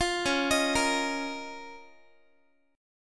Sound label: Db 6th Add 9th Db down Guitar; Db6add9; arpeggiated; 20,21,18,20,x,x